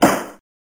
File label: judges gavel